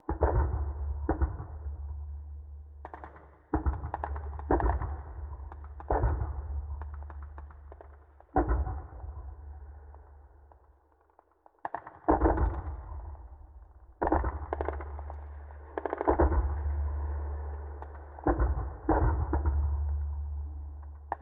warfare ambient guns low; War Thunder

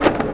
bt7 stop, treads tractor; World of Tanks Blitz

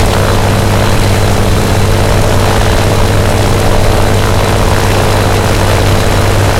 me109 side, engines; War Thunder

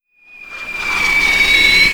mortar incoming; Explosions

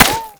concrete small

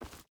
footstep hide forest run; Rust

